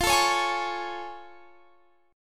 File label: A Augmented Major 7th F